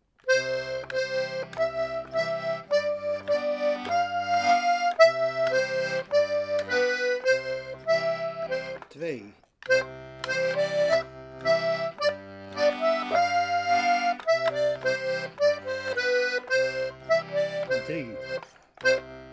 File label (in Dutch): Etude gespeeld, diatonisch; Etude 4